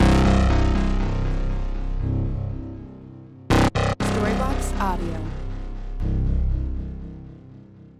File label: Ambient House Bass 120BPM C